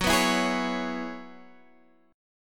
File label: Gb 7th Suspended 2nd Suspended 4th, Guitar, Half Step Down